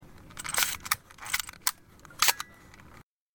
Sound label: auto, camera